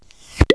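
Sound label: throw pop; Wired for Sound Pro CD; Aristosoft, Inc.; pops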